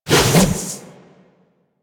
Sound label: Dodge Whoosh; Warframe; Wraith